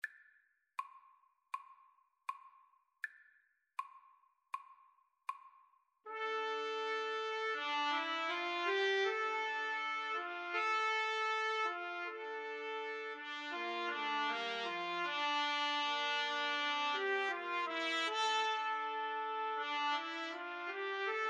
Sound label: 3TPT, Trumpet Trio